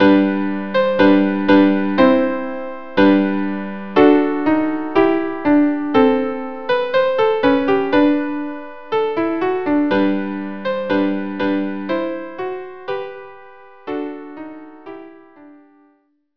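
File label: viola viola duo; St Anthony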